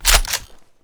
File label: bolt slap, weapons; tiki